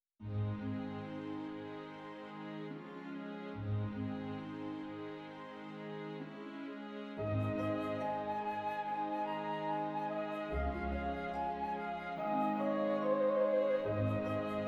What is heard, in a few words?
(flute version)